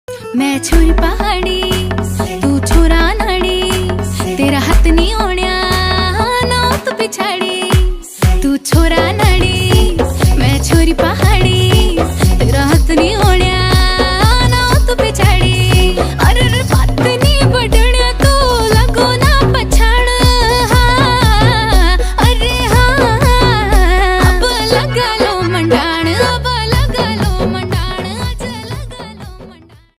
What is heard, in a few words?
uttrakhandi ringtone